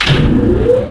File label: ion active; quake2; weapons